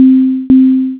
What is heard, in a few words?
SMS; IP Phone Development Kit; pxaemu; Voipac